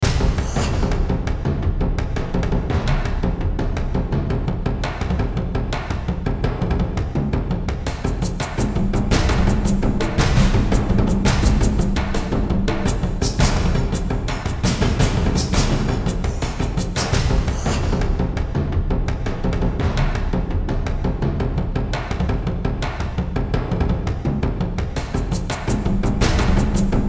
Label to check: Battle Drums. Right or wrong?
Right